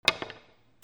BILLIARD

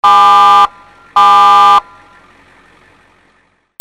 VEHICLES

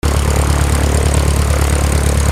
flight

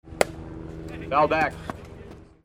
GAMES

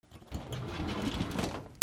OFFICE

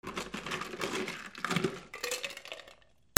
KITCHEN

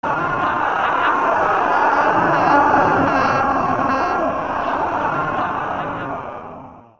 SCIFI

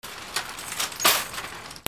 BANGS

